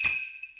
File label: bell; Merry Christmas